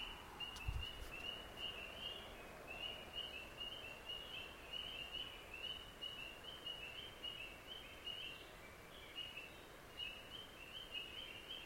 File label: frogs in a storm